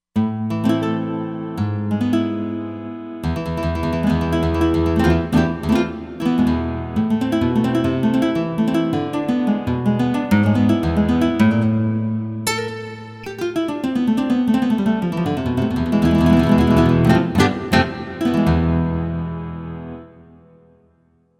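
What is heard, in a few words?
Flamenco Guitar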